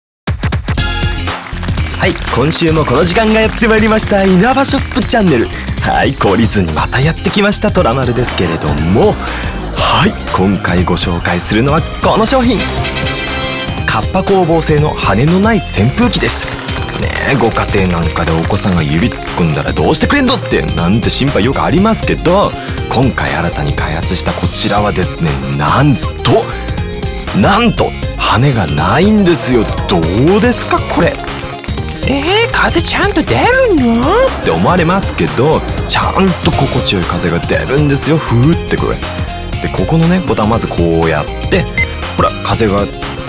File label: Off vocal